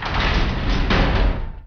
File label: KEY